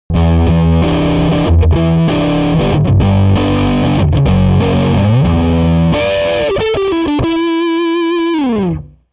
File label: Hot Rod arbiter fuzz face